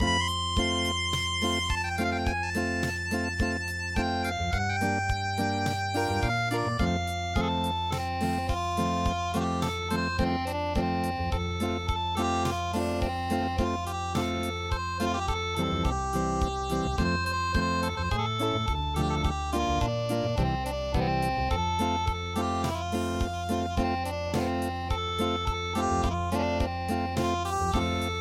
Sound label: unpluged